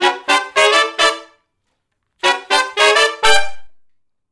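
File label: Funk Riff (G) harmony, Horncraft for R&B Sections; Crimson Blue and Fabulous Horncraft 4 RnB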